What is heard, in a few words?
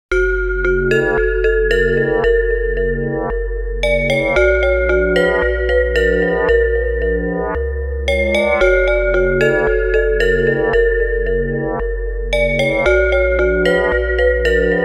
Synth Bell; Stator